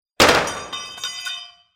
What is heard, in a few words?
Metal Impact Hit A4; fesliyanstudios